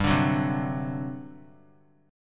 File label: G Major 7th Suspended 4th, G, down; GM7sus4 strummed